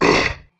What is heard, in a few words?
zombie pig death; Minetest; VoxeLibre; mobs mc